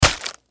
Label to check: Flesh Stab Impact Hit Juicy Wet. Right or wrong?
Right